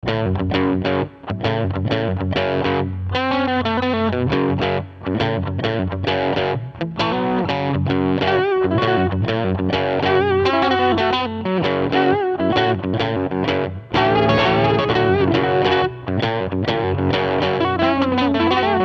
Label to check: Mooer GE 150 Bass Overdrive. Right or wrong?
Right